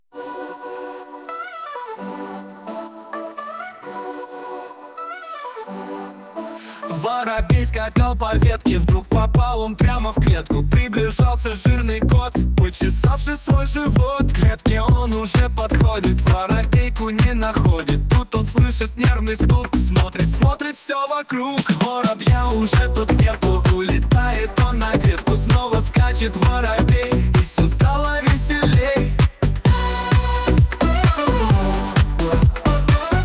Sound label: pop rap, house, techno